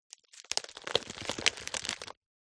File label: impacts card box contaning fragile goods squash break crack